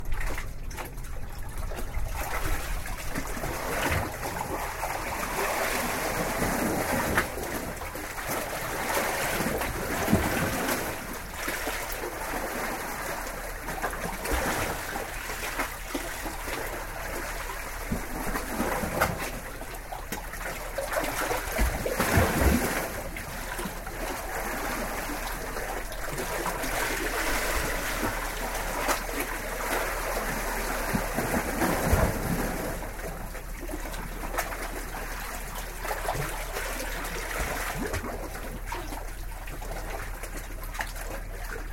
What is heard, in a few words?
waves lapping the rocks at godrevy head